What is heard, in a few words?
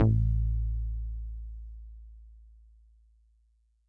Solid Bass DX100 A2